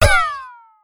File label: Impact